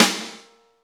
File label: SNR, Roland, Reverbs; Club-50 - Foundations Roland; Verb 3